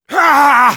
Spy pain severe

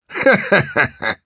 Engineer laughshort, ru

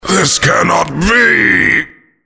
Krampus death

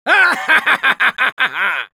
Spy laugh evil

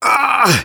taunt exert

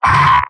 Pyro pain sharp; mvm

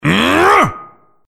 coal throw

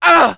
Scout pain sharp

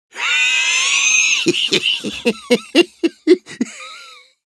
laugh long, ru; Длинный смех; Soldier

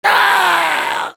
pain severe; Soldier

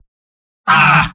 Spy negative vocalization; mvm

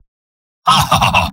laugh short

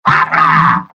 high five; Pyro; MvM

